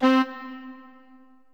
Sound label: sax combo